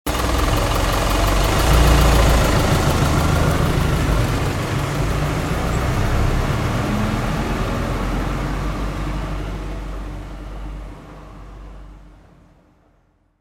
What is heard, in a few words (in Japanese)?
densya